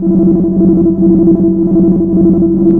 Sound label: MACHINES